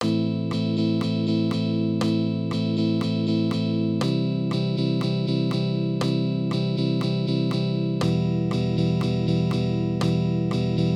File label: Arpeggios on guitar